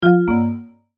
Multimedia Negative, Technology, Multimedia, Negative, Element; Alerts; BlastWave